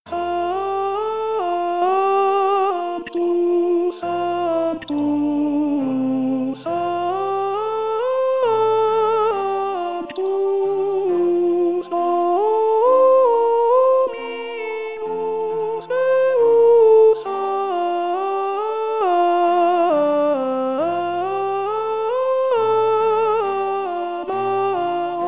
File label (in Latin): Missa VIII de Angelis Sanctus Coro